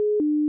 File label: warning